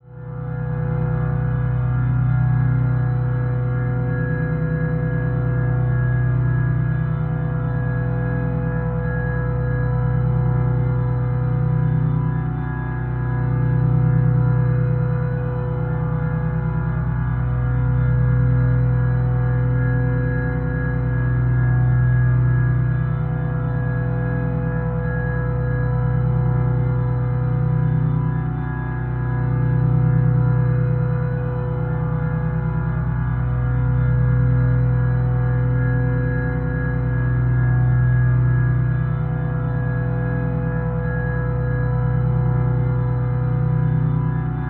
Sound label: Drone Horror Organ Constant Steady Bed; Production Element Imaging; AdobeCC